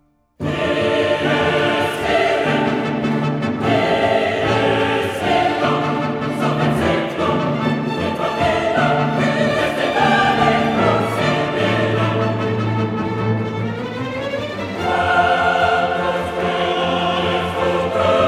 Requiem for soloists, chorus, and orchestra, Dies Irae; Amadeus